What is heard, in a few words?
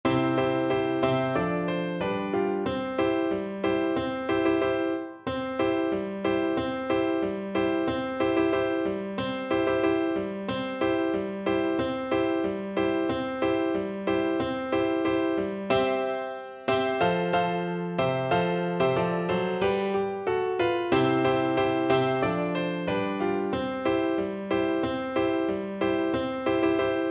ten in the bed, Flute